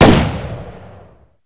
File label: aug, weapons